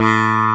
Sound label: sitar; cubic